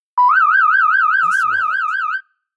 صفارة إنذار; transitions

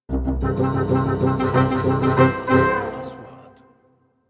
إنتقالة كوميدية

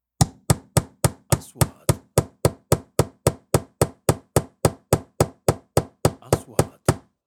مطرقة; sound effect

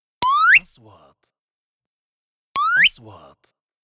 نغمة نقره هوائية; transitions

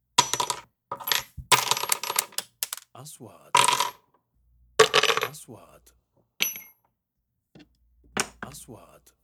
مسامير; sound effect

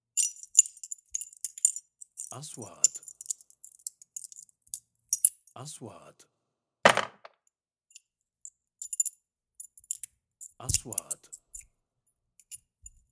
مفاتيح معدنية; sound effect

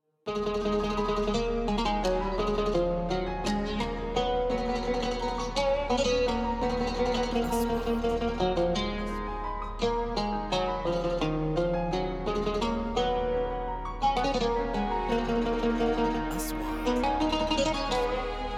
موسيقى تصويرية وطنية

